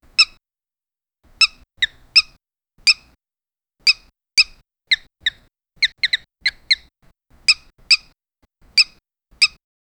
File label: cute penguin footstep, toy